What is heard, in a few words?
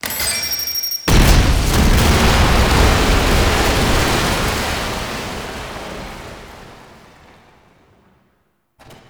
Nokko Visions Mineshaft Explosion; Warframe; Nokko Colony